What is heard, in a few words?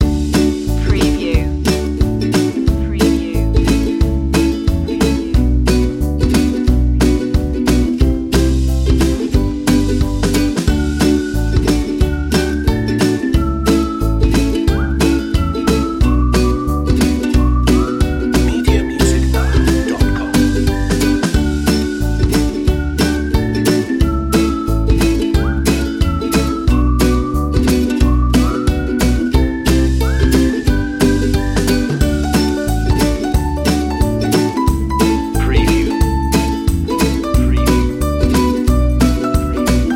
uplifting and happy ukelele